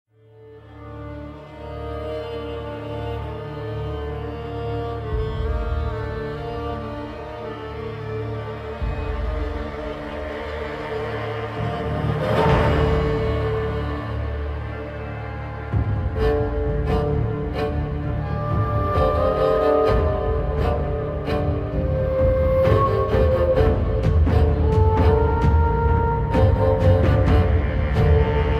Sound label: Tension; White Shores